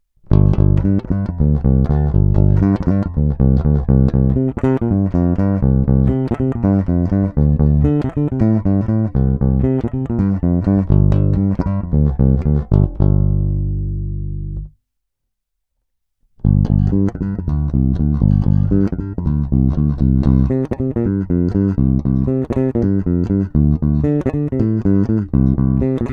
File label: Greco TB-750 Thunderbird Bass